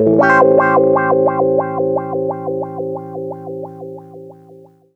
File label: 089 WAH PAD, Electric Guitar Loops; USB Soundscan vol.04 - Electric & Acoustic Guitar Loops; AKAI